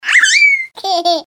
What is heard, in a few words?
laughter short